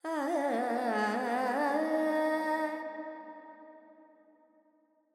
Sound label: Verb Dorian, Vocals, Carnatic Female, Alaps, 70 G